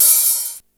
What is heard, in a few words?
open hat, Yamaha RY-8